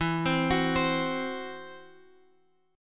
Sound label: E Suspended 2nd Sharp 5th E, Guitar, Open F; arpeggiated; 16,19,18,19,x,x; JGuitar